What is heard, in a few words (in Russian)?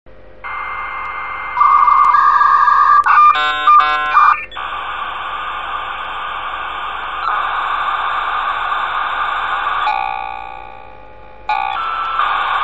zvuk modema zvuk